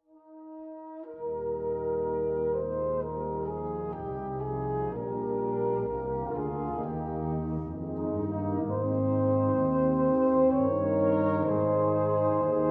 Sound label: Chimes